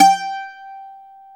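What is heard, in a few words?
bouzouki FF G 3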